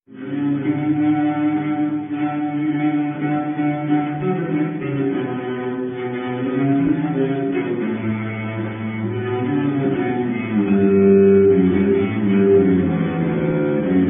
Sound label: sorahi